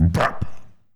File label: kill; tf2